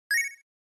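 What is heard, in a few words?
Game Boy General Pitch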